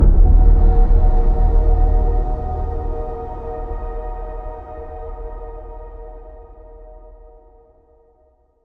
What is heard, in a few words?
Combat Very Low Threat End Stinger